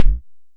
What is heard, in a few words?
Kick - Fat Low; Tama TS-206